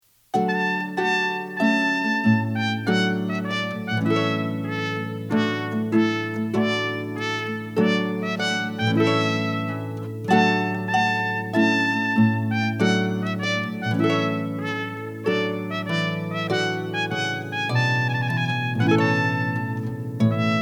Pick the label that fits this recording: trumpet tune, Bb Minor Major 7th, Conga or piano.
trumpet tune